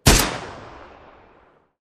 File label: Gun Sound; Gangstar; Gully Loops